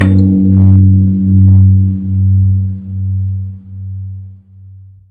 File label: temple bell huge